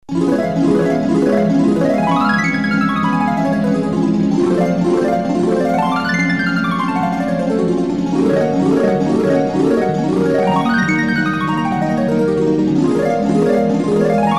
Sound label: harp; Klangesreichtum; soloduotrio